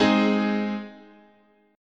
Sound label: G sharp Major, chord, guitar